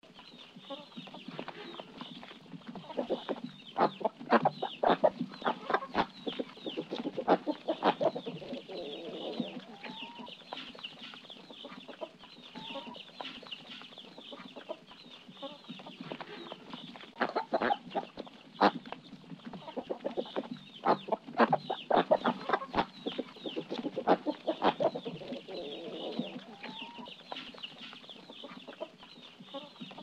farm ducks and chicks

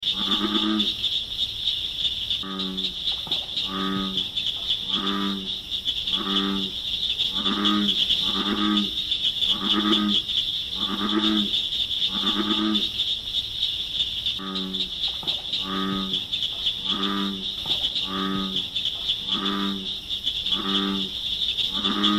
frogs bull and cricket frogs

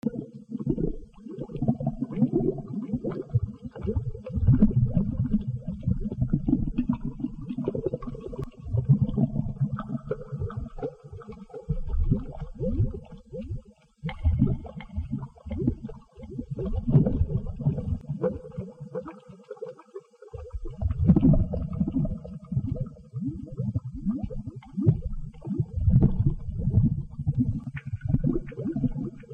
scifi fluidic space

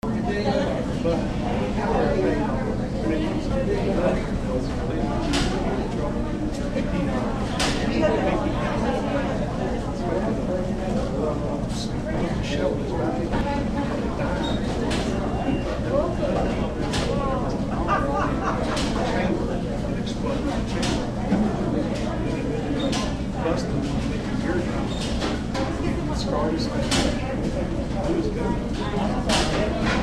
atmo nevada las vegas restaurant crowd; in n out

